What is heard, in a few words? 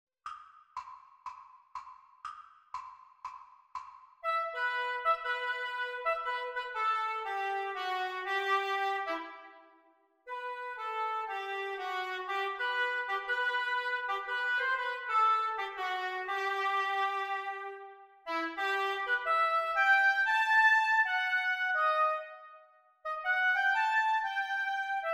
oboe duets practice; practise speed